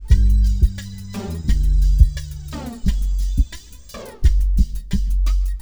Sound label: BEAT, Hip Hop